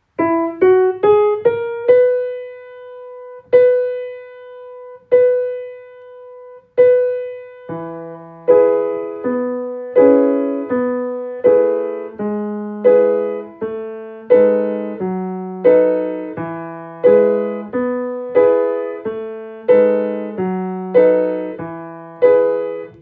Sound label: Jeering Song; Bartok